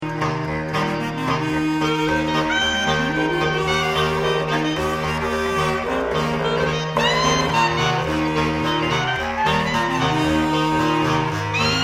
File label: Primitive Southern Brass Band